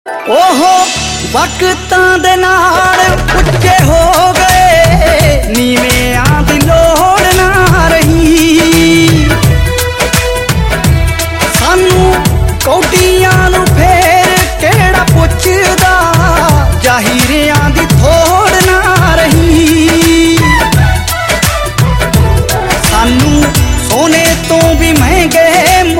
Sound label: Punjabi New Sad Tones